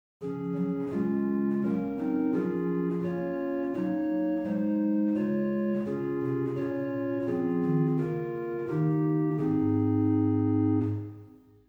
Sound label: Rohrfleute 8, organ stops; Silbermann middle-sized church organ; St. Anne; Reinhardtsgrimma